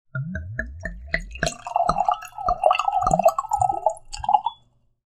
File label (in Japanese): ワインボトルを注ぐ音